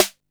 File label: Snare, Drum & Bass